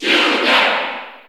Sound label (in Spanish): Grito del público (Ingles); Bowser Jr.; SSBU